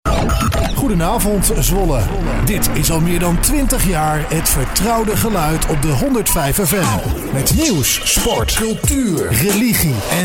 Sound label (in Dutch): Jingle Compiltatie Radio